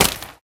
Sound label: impact weapon small earth; collide; small-weapon